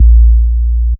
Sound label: Bass Shot A#